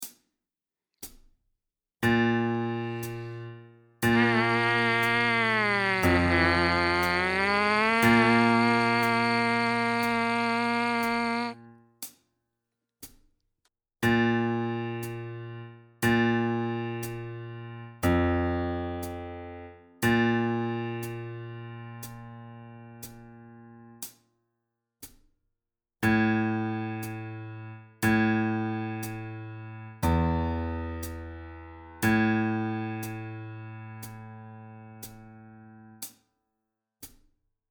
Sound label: buzz exercise, trumpet